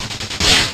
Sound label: Needle Arm